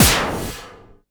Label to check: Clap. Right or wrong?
Right